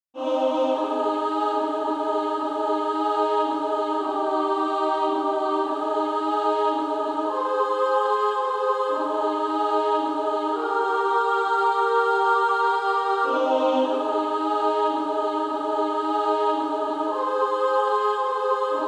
Female Choir SA; Ninety and Nine

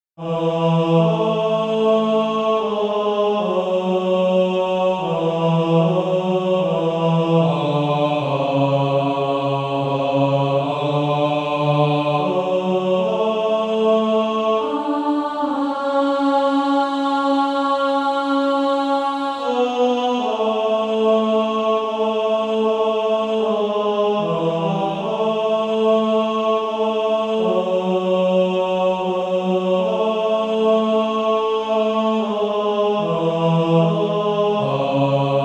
Male Choir; Christ Liveth in Me

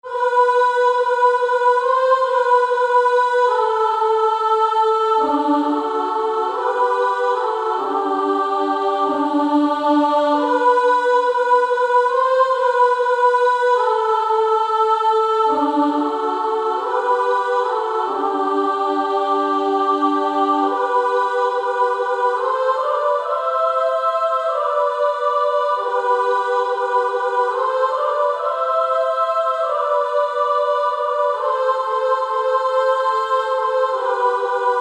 Female Choir SA

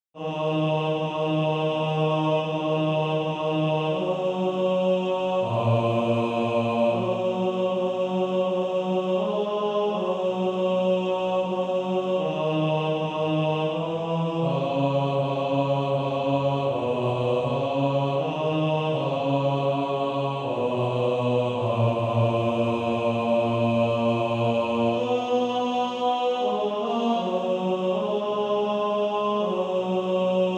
Male Choir; Baritone

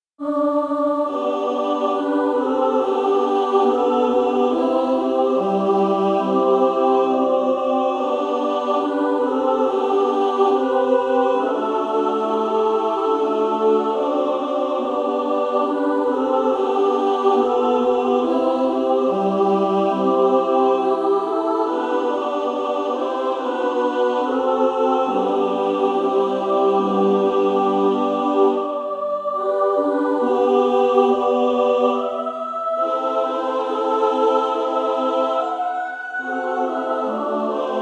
Female Choir

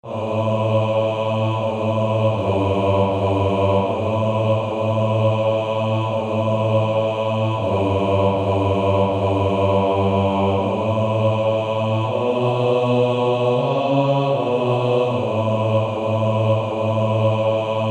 Male Choir; Low In The Grave He Lay

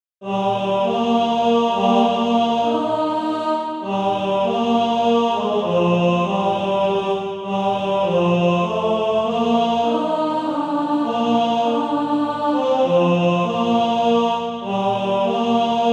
Male Choir T1; A Child of A King

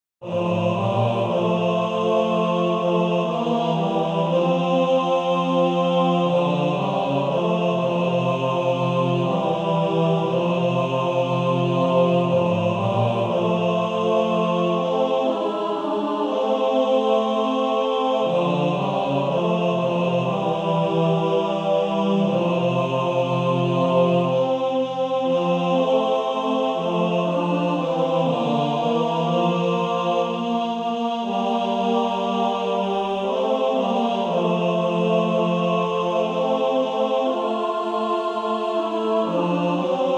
Male Choir TB

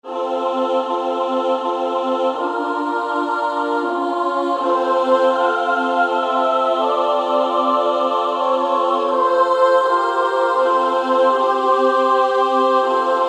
Female Choir SSAA

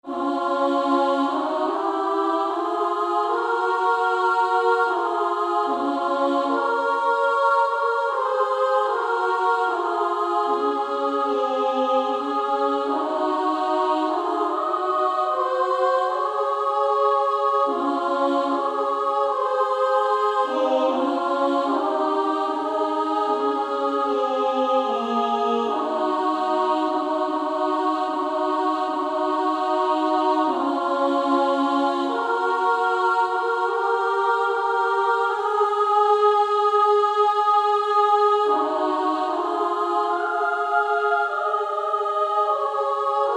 Female Choir SA; Lead Me Gently Home Father

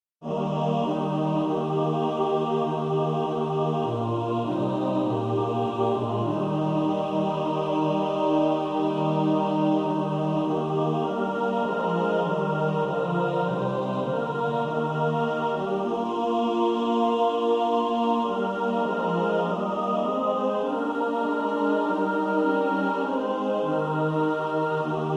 Were you there mixed

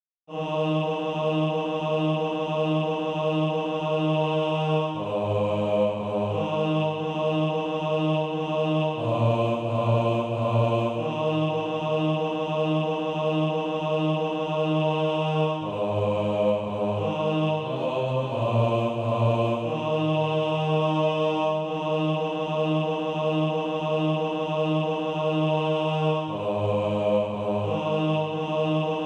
bas; A Cry from Macedonia

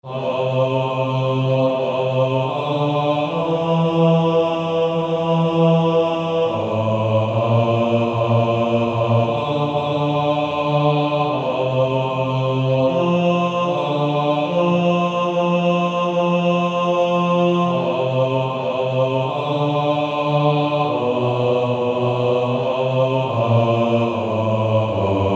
Male Choir B1; Nearer still nearer

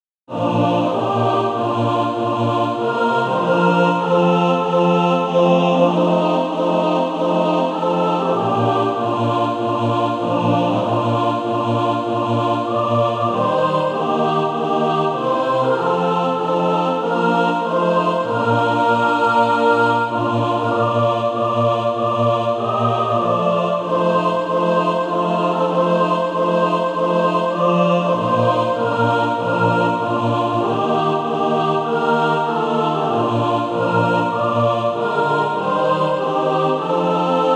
mixed

